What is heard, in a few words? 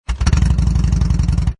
Motorcycle Harley Davidson Start Up Exhaust Perspective; Motorcycle Harley Davidson Start Up Idle Shut Off Engine Perspective. Motorcycle, Motorbike